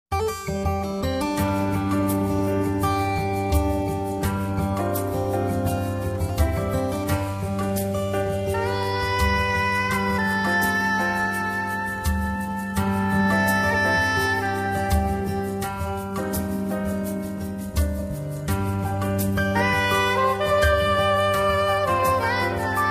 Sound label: Light Sax